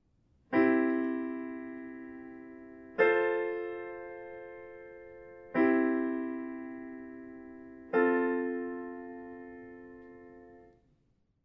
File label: Common Tones